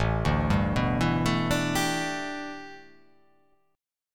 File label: A 9th Suspended 4th, A9sus4 chord; arpeggiated; JGuitar